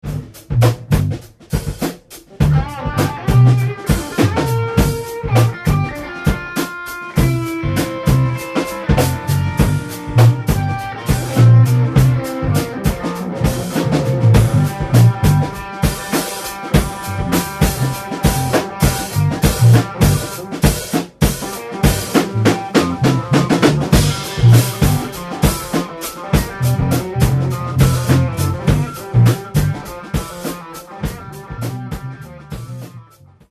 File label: G Funky Boogie; ax84